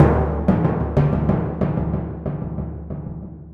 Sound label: Timp